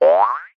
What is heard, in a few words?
cartoon bounce spring jaw harp